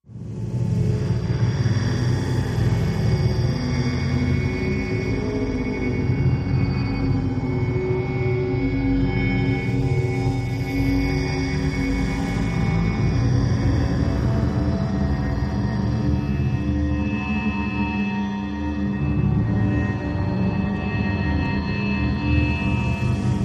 Creep Dark Eerie Cave, horror

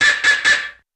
Single Car Horn Honk

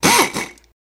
Saw, Air Tool, Epidermal, Bone or Skin, Mono; hospital & lab equip

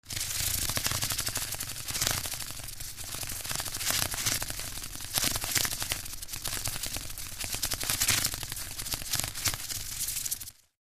Birds Rapid Wing Fla; wings

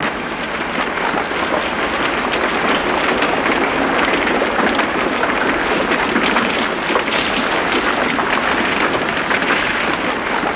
Rock Fall Mid Range, avalanche

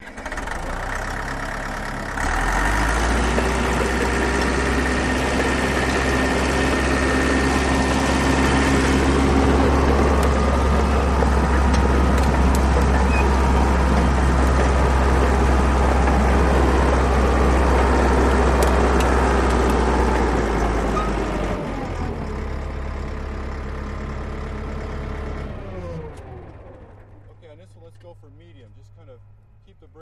Bulldozer Power Up Hill And Stop

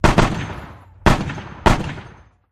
.50 Caliber: Multiple Shots With Shells Dropping

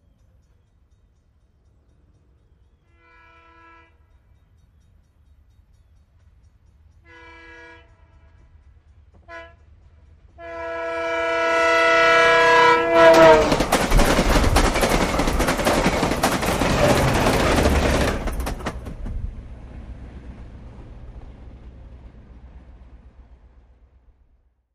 Commuter Train Bys

